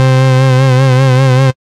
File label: Synth Keys; DeElevator; Operator